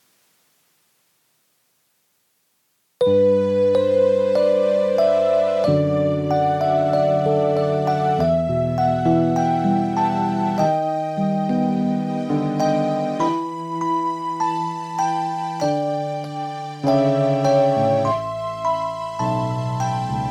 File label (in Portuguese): playback hino